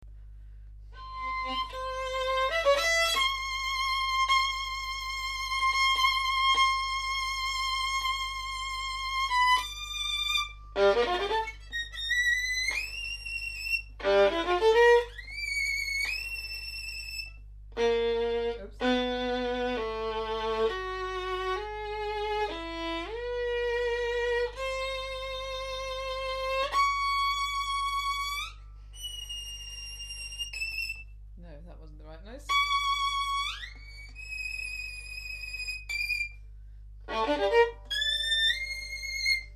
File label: violin